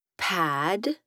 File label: pad